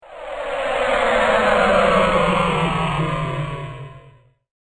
Engines Grind To A Halt, spacecraft; Spacecraft Sound Effects; science fiction sound effect

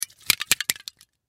Silly String Shake, Silly String Shake Can; Shaking

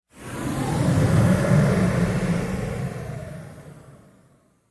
Sci Fi Spaceship; Sci Fi Spaceship Fly By; Spacecrafts

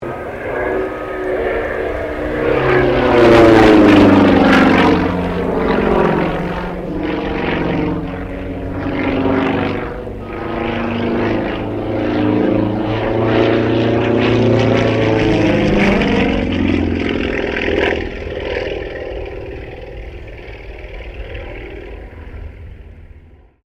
Sukhoi Su-26M acrobatic pass; SU-26m Acrobatic aircraft; Airplane; Vehicles

